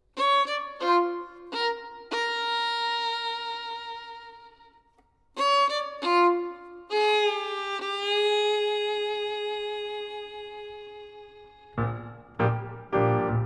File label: Violin; Gershwin Prelude